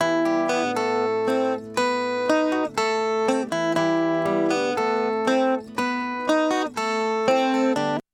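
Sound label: acoustic guitar pitch shift doubler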